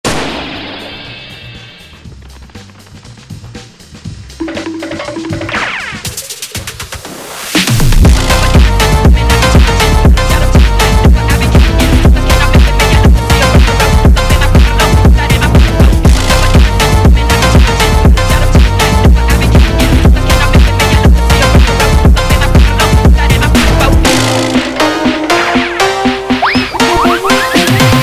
Phonk

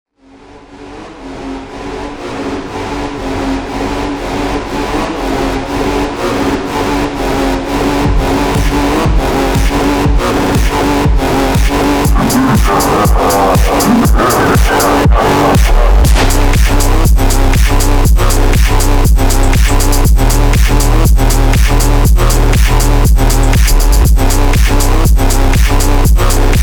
Phonk

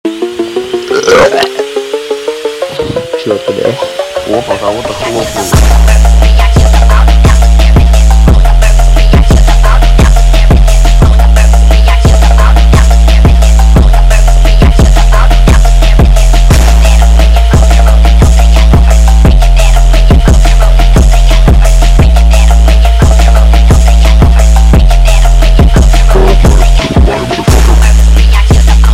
Phonk

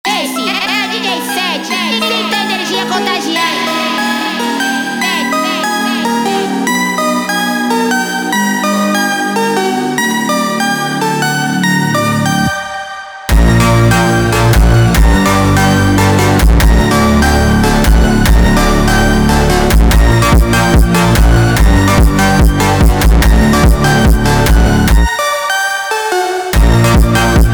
Funk instrumental sped Up

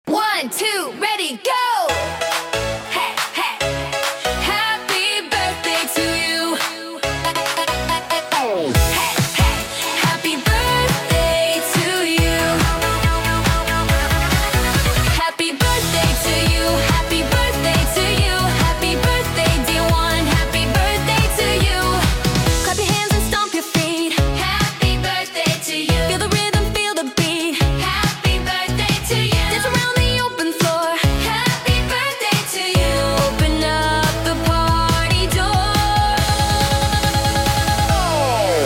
Birthday Countdown Beat

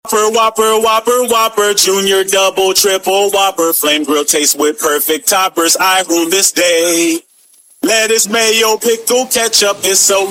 PHONK; BURGER KING; ringtone

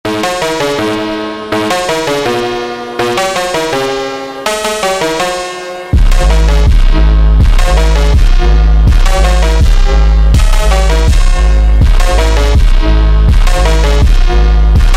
Christmas Phonk slowed Music Remix

